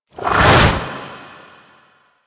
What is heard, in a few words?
Frost Cast; Warframe; Warframes